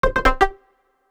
Blocks alert; Sony Ericsson; PlusFourSix; SE Sound Project; Paul Bergman

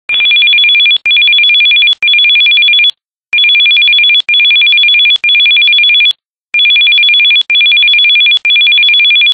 Telephone Ring

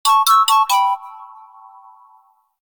Glacier, notifications; LG Revolution VS910

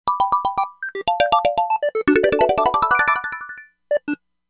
Funny Alarm; Yamaha SMAF demo ringtones; SMAF Entertainment

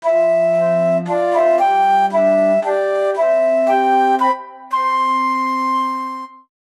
flute, alarms; ZTE nubia Z20; nubia mile

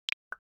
cam popup close; LG Wing 5G Verizon

